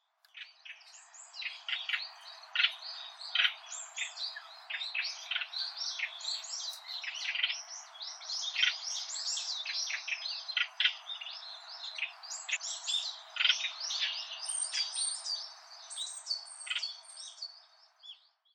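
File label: light-vented bulbul call, okinawa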